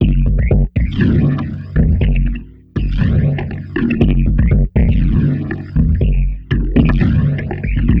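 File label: Loop, beats; Sonic Foundry (Sony Creative Software); Esoterik Beatz; Mecan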